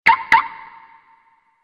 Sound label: car arm alarm